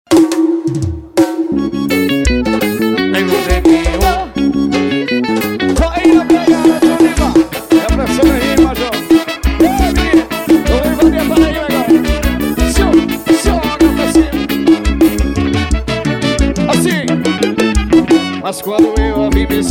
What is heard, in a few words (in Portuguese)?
forró